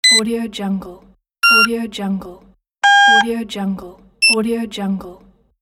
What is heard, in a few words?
button beep